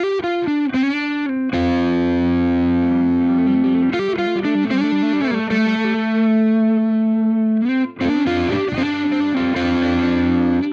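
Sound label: Hagstrom Ultra Max Special Neck Crunch, guitar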